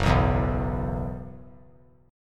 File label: F sharp 7th Suspended 4th; F#7sus4 strummed